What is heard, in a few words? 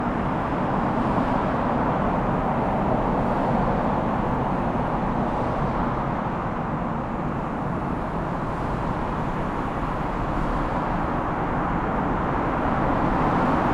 CAR WIDE